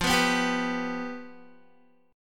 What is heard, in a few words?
F Suspended 2nd Flat 5th, Guitar; Fsus2b5; strummed; 12,14,11,12,11,x